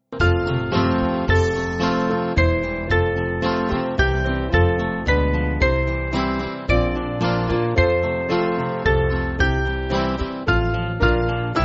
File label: Band, Free Music Backing Files for Churches; Herr Jesu